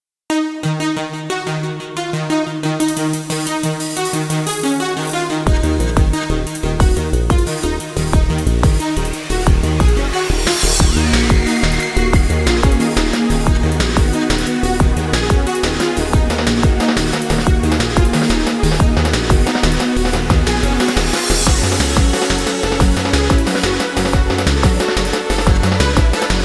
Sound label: DRUM'N'BASS